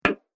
Wooden Whack Sound; Wood Sound Effects; Impact

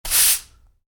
Sweep hard brush; Miscellaneous Household Sound Effects; Miscellaneous Household (Sound Effects:Household); Stuart Duffield

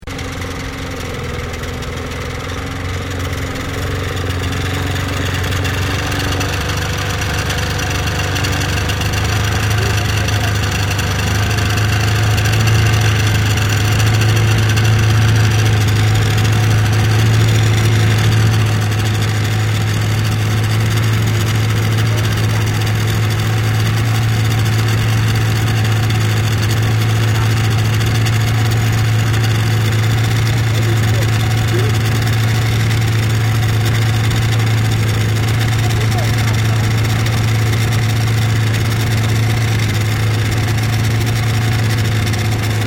Boat Small Extended Idle; Various private boats; Vehicles

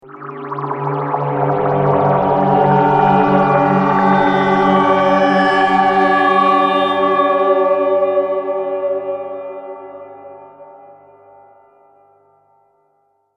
Audio Logo Haunted Transition, sonic logo

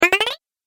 Arcade Game Up Bleeps; Video Game Sound Effects; Retro Inspired Sound Effects - Think Nintendo, Atari, C64

